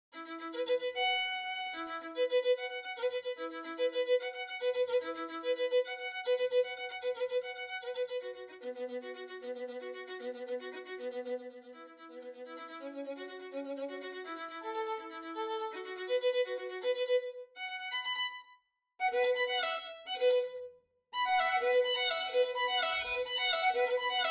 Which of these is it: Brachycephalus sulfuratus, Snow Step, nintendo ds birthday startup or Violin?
Violin